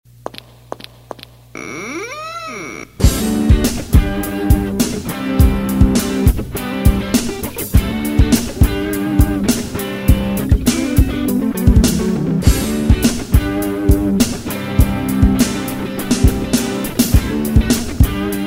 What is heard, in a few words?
guitar pop